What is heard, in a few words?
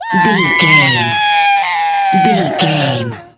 voice female scream low